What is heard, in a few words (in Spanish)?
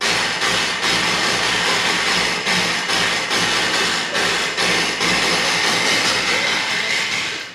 Quijada